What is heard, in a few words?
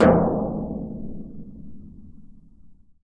TMP C# HAN, World & Orchestral Percussion; ILIO; Synclavier Percussion 2